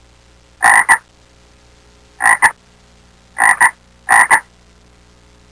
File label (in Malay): katak